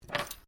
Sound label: Cutlery general pick up, Household cutlery; Kitchen; SoundEffects Foundation2